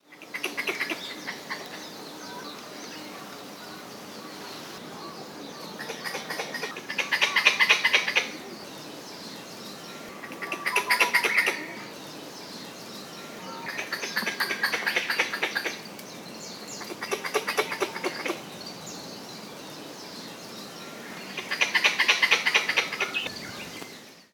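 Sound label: Pallas's Squirrel, kamakura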